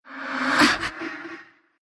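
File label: pain, Russian; dota2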